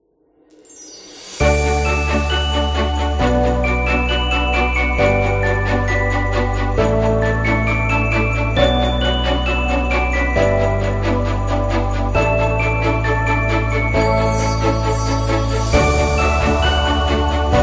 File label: Christmas Elegant